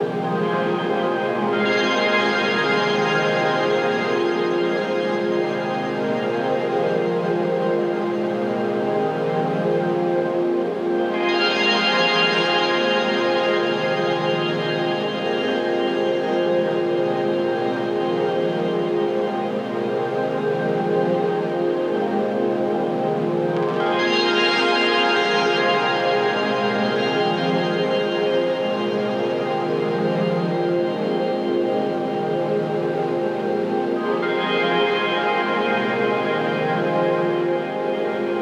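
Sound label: pad loop Church Of Synth F minor; Virtual Riot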